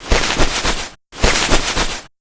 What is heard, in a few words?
rummage